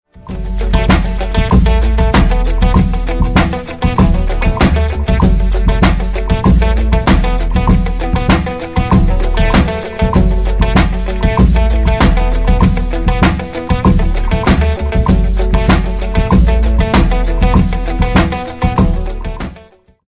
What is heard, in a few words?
bass and boom ringtone